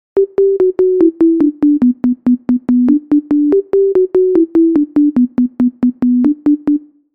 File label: synth line